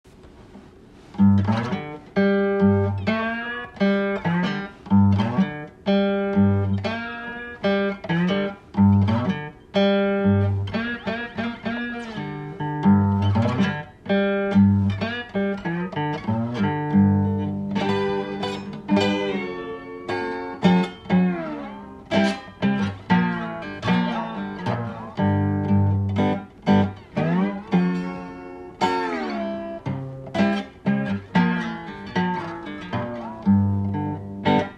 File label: Slide riffin